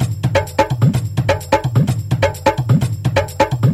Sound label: Percussive Loops 128BPM; Vengeance Samples